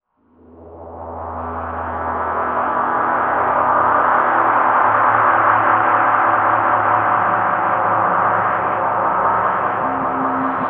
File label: octave, guitars; Big Fish Audio - Alien Guitars; HOLY OCTAVE